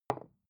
glass put down wobble light

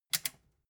metal button click power on fast

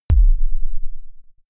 sub kick noise

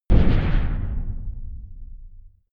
big metal impact low soft

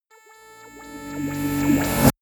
rise buzz medium

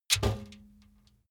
trash basket metal hit rattle

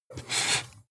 marker mark soft short

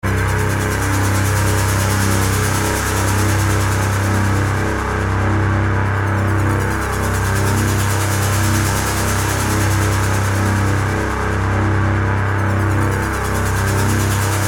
rough dark tone pad

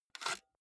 cassette out of walkman

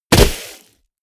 body fall snow hard

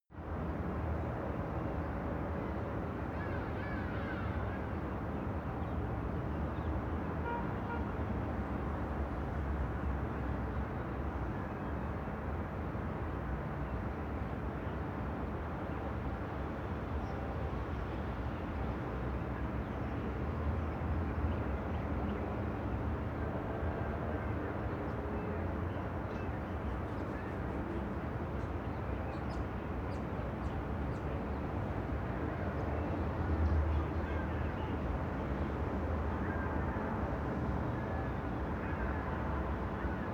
morning traffic distant

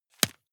juggle catch hard

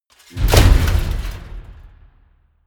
metal crash medium

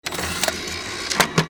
electric door

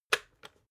water boiler switch on

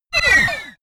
sci-fi laser shot

